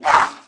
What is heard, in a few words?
Mutant Quick Attack; Valleakan Mutant 2; Aliens